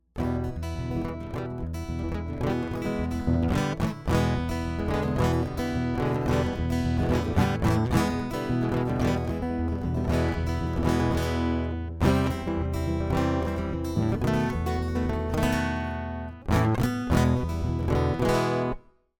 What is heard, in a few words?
guitar loop; Making the Music